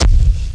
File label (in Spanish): pasos